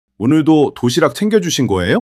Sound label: surprise